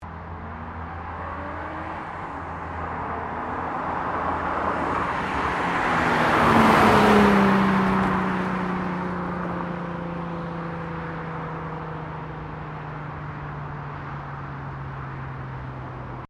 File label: CAR NISSAN 350Z EXHAUST PASSING, manual sportscar; Cars (Sound Effects:Vehicles)